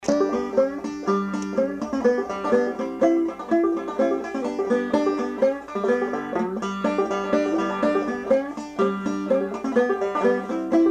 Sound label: Banjo